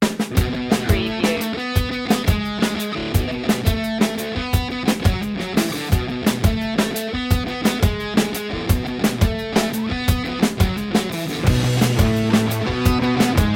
punk pop

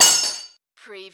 Button Sound - Metal Clamp Drop

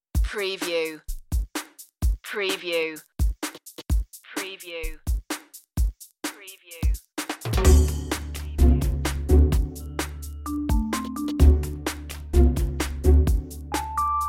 life style tv light comedy

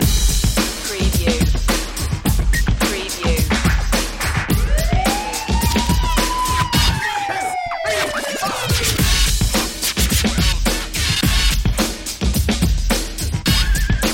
street vibin

